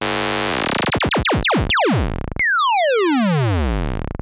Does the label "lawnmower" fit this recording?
No